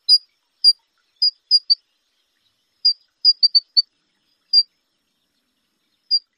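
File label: calls, Lawrence's goldfinch; AZ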